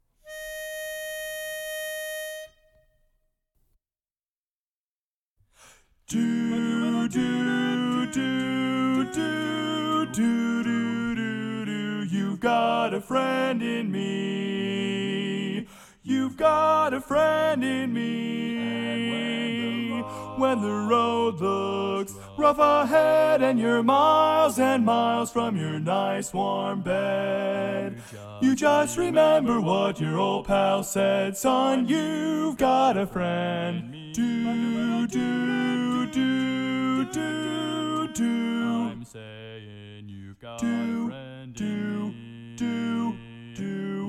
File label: Lead